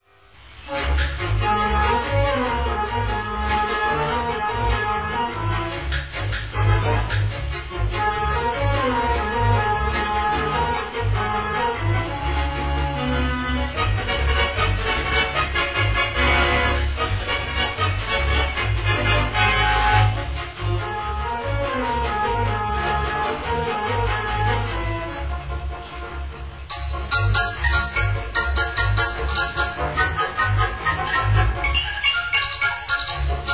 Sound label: Mystery Jazz